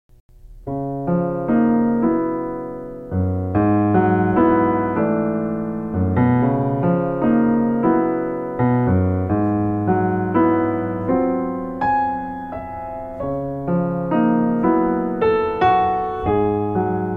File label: harlequin waltz